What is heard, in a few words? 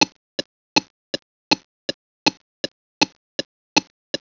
korg beatlab, metronomes